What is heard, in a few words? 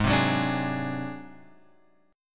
G sharp 7th Flat 5th G sharp, chord; G#7b5 Chord {4 3 4 X 3 4} strummed